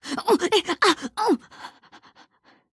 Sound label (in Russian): Удар током